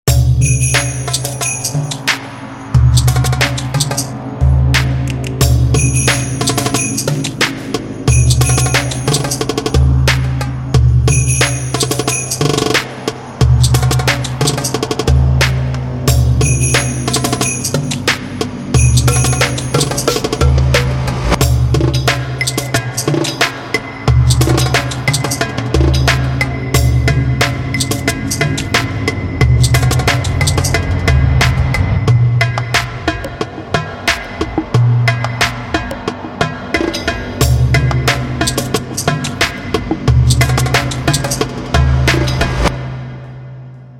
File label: Ultimate Percussion Library; 电影终极打击乐; Ghosthack; 沙锤、铃鼓、木质、钢质和金属乐器、邦戈鼓、拍手声、拟音、合成打击乐; 达姆贝克鼓、卡巴萨鼓